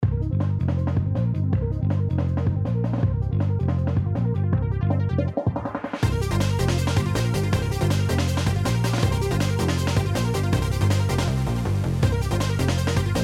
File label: hyper synth